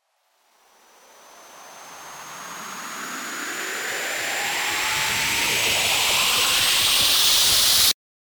RISER

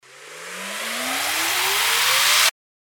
RISER

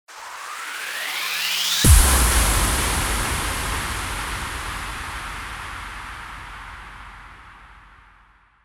RISING IMPACT

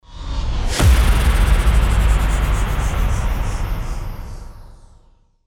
IMPACT

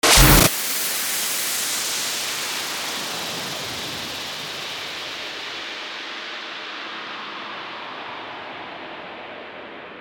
STARTER WHOOSH

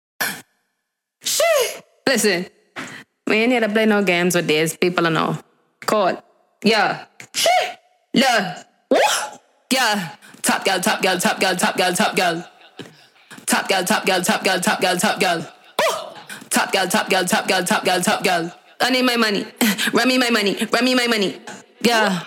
79BPM Abm

